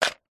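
household vitamin tablets in plastic pot lid on set down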